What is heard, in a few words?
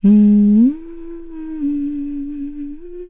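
Vox Hum, Korean; Laudia; kingsraid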